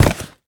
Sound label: cardboard box impact hard